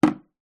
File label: household soap dispenser plastic down on sink